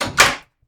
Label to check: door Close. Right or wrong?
Right